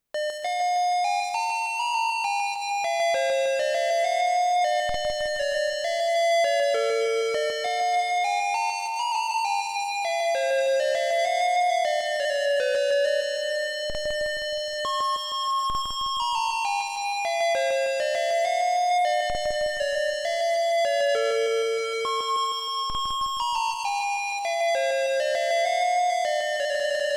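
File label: Intro PC speaker (emulated); KQ2; AGI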